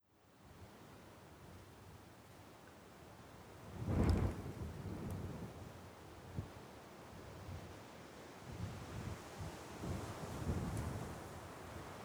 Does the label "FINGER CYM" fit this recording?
No